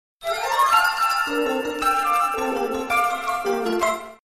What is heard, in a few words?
Noel Messages; Christmas 2022